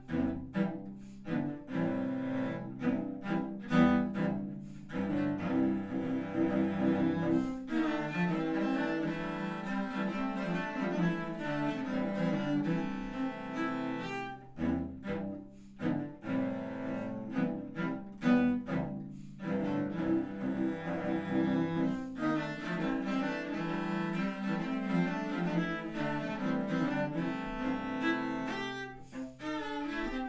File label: Cello Ensemble